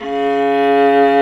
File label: Vla C#2; Digital Sound Factory Virtuoso